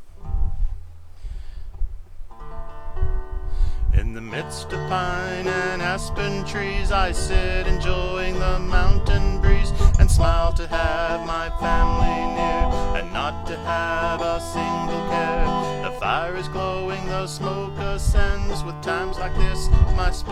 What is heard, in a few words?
strum